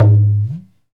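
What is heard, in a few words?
percussion tabla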